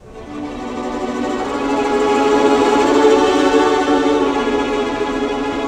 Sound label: Orchestra Mood; Zero-G Jungle Warfare 1, 2 & 3; Strings & Things